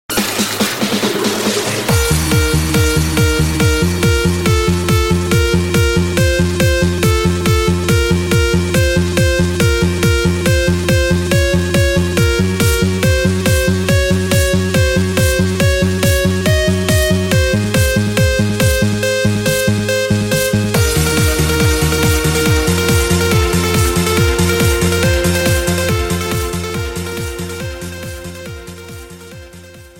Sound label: strings